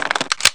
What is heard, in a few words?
XBOW COCK; REDNECK; RAMPAGER